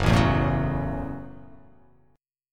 F sharp Minor 7th F sharp; strummed; JGuitar

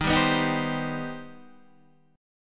C Minor Major 7th D sharp

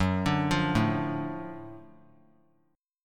Gb Minor Major 7th Sharp 5th Gb down; GbmM7#5 arpeggiated; JGuitar

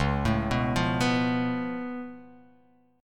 C sharp Augmented 7th C sharp down, chord

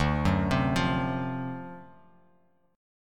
D 7th Sharp 9th D down, chord; D7#9 arpeggiated; JGuitar

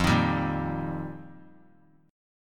F 9th Suspended 4th F, chord; strummed; JGuitar

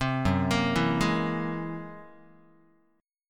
F sharp 9th Flat 5th F sharp Guitar; arpeggiated; JGuitar

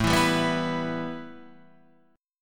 A 7th Suspended 2nd, A, down

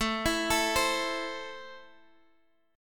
A Minor A down Guitar Open G

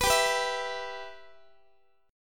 G 6th, Guitar; G6 strummed; x,x,14,17,15,15

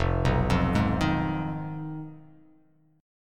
F Minor 7th Flat 5th F down

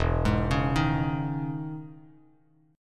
E Major 13th down Bass Standard; EM13 arpeggiated; JGuitar

